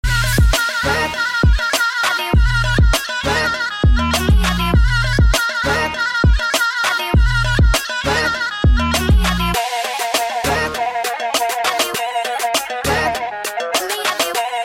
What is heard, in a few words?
Mobile Ringtone